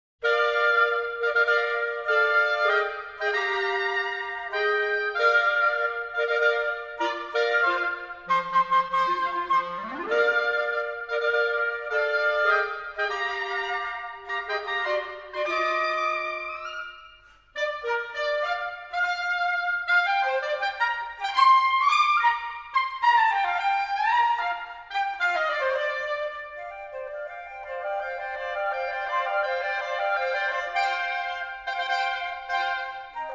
Divertimento for Flute, Oboe and Clarinet, Maestoso; Op.37 V.